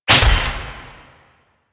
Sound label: Melee Slam Impact; Warframe; Necramech